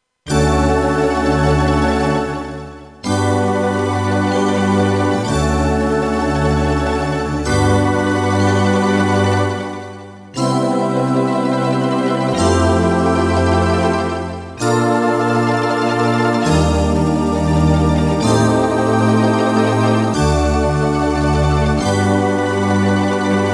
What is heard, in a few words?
Bright Digi Pad; Carmol